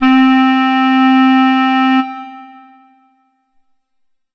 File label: Yamaha SY-35 Clarinet C5